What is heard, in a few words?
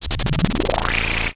FX, retro house